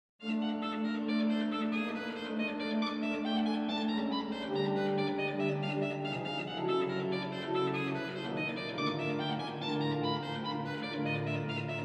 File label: Vintage car, for organ and harp